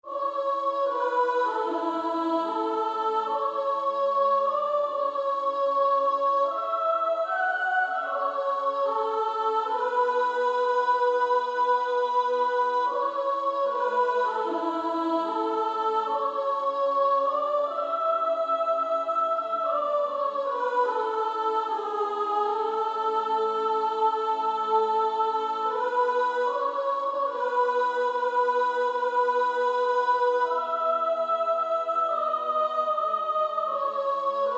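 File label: Female Choir, soprano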